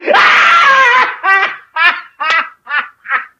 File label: crazy, halloween; Source Engine; tf